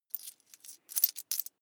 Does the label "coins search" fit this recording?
Yes